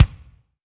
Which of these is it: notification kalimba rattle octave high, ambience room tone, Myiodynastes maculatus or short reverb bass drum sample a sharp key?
short reverb bass drum sample a sharp key